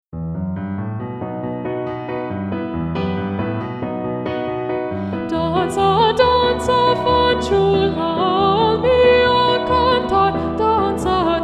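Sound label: danza danza, e minor, chorus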